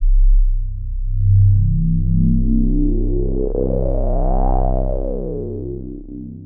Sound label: Bassy Sweep, techno drum fx; Single Hits